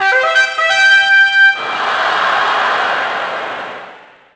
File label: Ditty; NBA ShootOut 2000; PS1